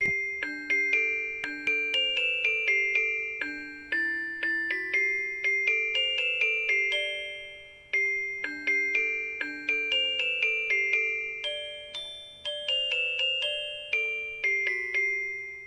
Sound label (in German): Weihnachtsgruss gesungen; Weihnachtsgruss 2013; Adventskalender